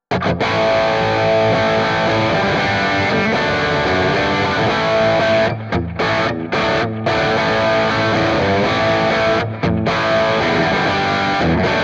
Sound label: Kemper vs Quad Cortex Dirty Rhythm Quad Cortex